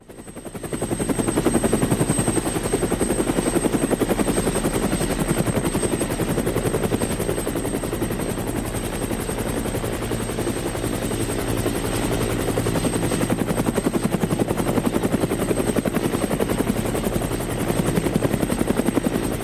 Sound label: helicopter